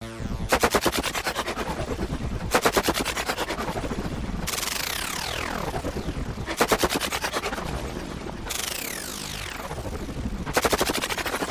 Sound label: Stutter-Abrasive shudders transform effects; granular